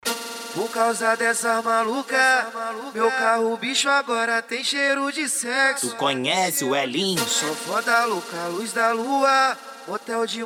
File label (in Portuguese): especial funk